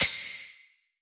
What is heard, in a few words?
soft hit whistle; osu